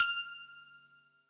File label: bell F7